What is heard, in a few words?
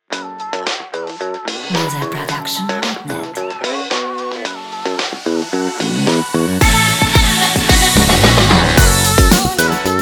Energetic Hip-Hop and Funk Background